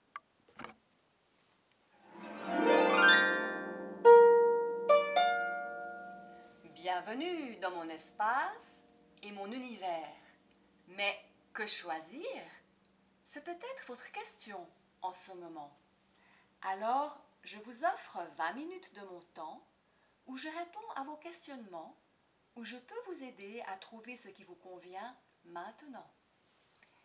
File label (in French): Harpe